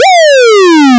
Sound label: Quik Yelp; ELK-120